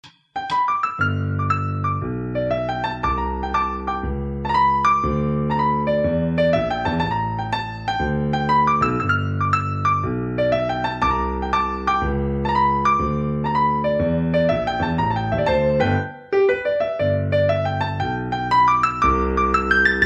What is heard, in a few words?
BLues